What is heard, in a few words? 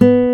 a#4-c#4, nylons guitar